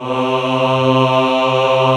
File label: cho C2, Choir Male AH, AKAI S-1000; Retro Sampler